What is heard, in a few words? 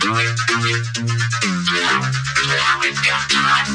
128 now we got time vocode beat; VOCAL OR VOXY LOOPS